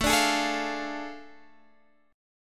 A Major 7th Suspended 4th Sharp 5th, Guitar, Open D